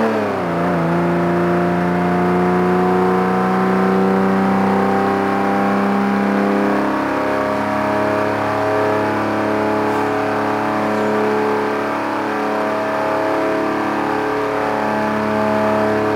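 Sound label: third, car engine; sgmcars; modelt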